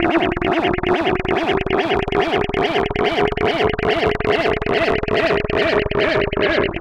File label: Noise, LFO & Noise